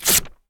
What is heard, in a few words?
paper, tearing paper